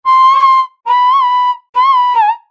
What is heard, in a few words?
Hurt Voice